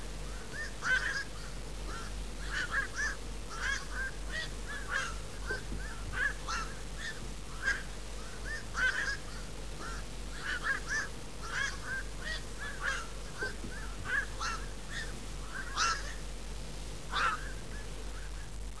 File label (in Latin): Nycticorax nycticorax